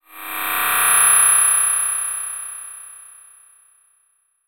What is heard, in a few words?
Transition Digital Scanner